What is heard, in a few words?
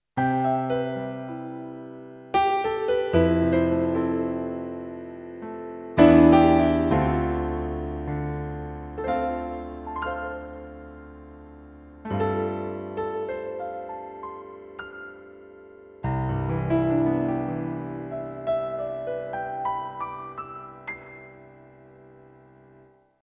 PSR-S670 Concert Grand